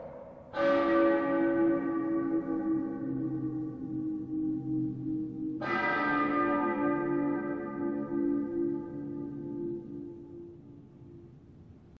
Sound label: bells; Apex Legends